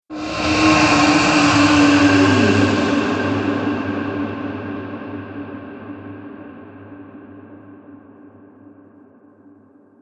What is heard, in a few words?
Dragon Reverb Swell, monster; horror